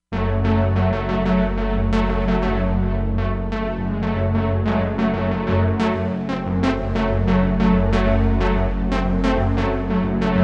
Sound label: Stab Pad, Korg MSC-07S Synth; Korg MSC-07S Synth 2